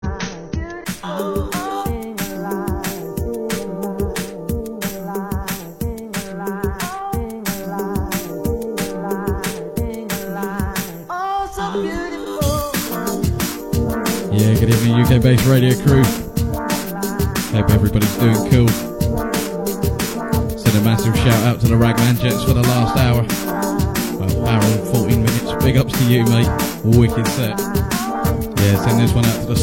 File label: Drum and Bass Jungle, UK Bass Radio; shows from the UK Bass archives